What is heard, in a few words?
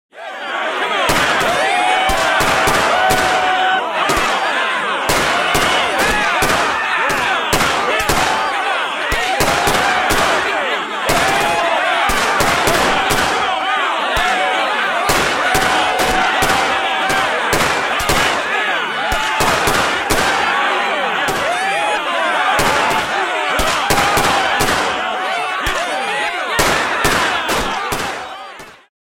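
cowboys having fun; Толпа кричит от испуга, восхищения, негодования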